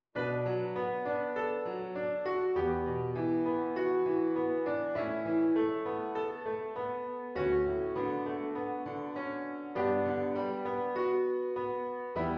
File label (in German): Wie der Wind Klaviersatz voller; Cramer